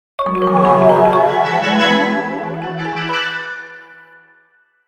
Sweeper Swells Twinkle Burst, Sweeper Slow Electric Phaser; Production Element Imaging Element Accent Transition; Blastwave